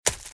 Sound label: Footsteps - Leaves - Sprint - Right; RadwinIsland